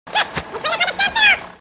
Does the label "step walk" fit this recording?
No